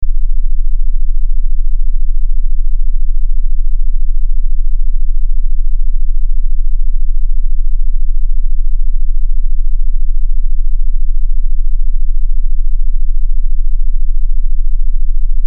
binaurals accel

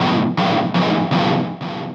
guitar loop

